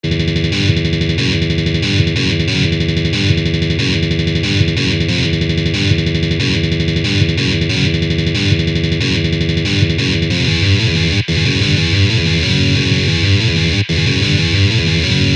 Lick

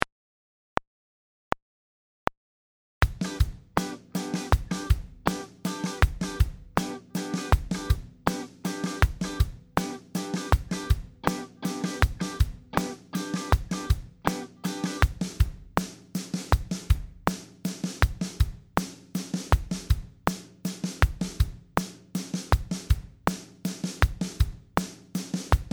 groove r&b

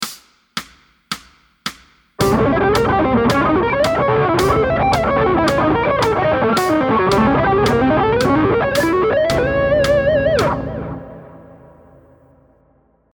Lick

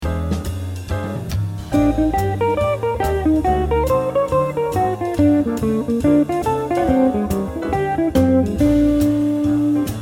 Lick

